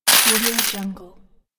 money payment

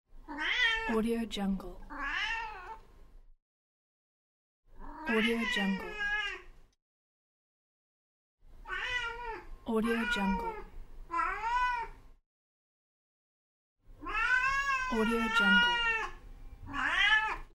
cat meows

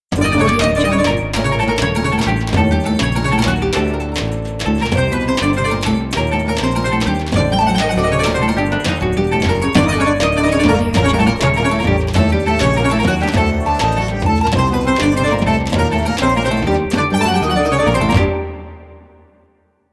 turkey orient logo

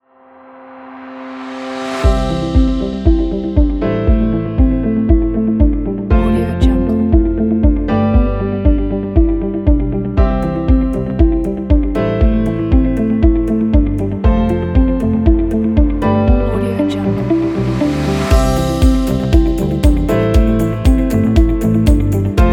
sunrise

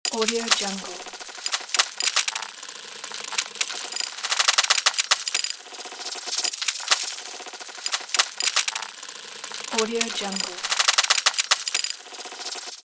granular mechanism; bogdanbogdanov

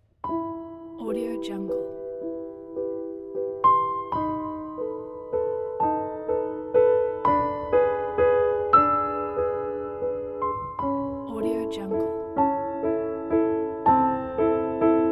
melancholic

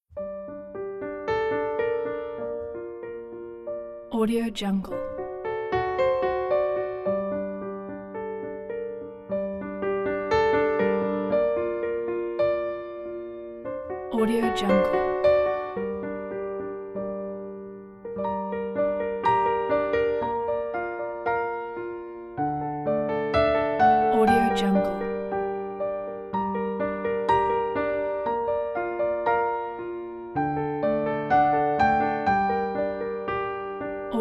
romantic emotional piano solo

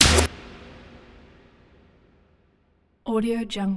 impact electronics; soundmorph

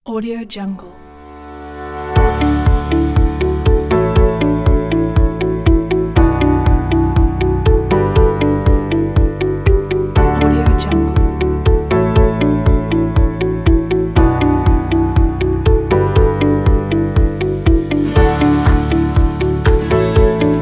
upbeat motivation pack

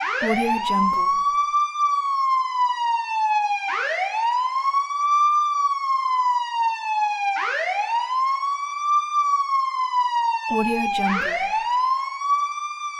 police siren; icombbeats

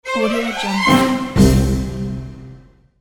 orchestra short logo

